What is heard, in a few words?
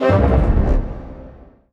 MUSIC EFFECT Orchestral Brass Negative; startide macos switch windows gamerip 2017